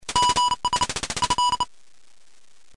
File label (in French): musique victoire